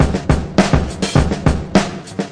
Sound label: oldskool basic, 103 bpm, drum loop; 101 to 110 bpm